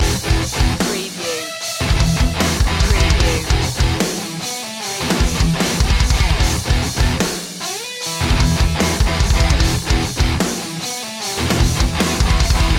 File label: thrill junkie loop